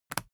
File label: briefcase plastic latch lock unlock unsteady; industrie wirtschaft; bueroarbeit